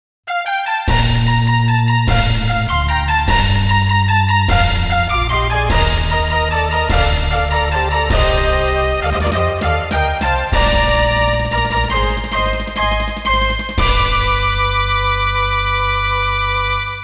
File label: Fanfare - Overseas Race - Europe; koei original bgm collection vol.13; winning post; winning post 2